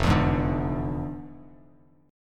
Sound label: F Minor Major 13th, Bass Standard; strummed; JGuitar